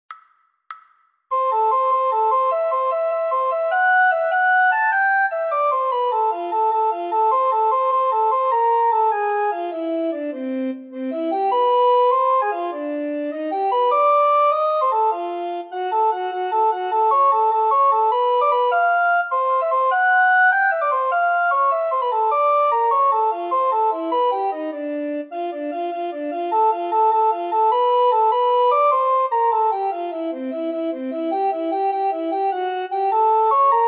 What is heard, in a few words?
descant, recorder